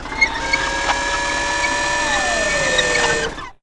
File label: crane lower; Nickelodeon - Destruction Truck Derby